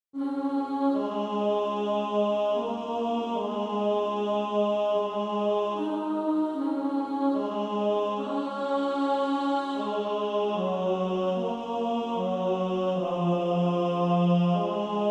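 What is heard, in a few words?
Female Choir, alto 1; I Praise God’s Love Female Choir Sheet Music SSAA 4-part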